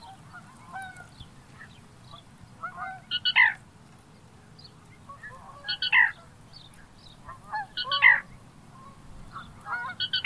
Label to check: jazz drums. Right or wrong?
Wrong